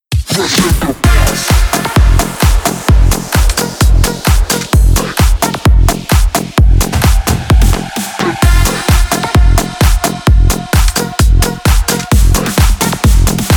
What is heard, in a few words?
edm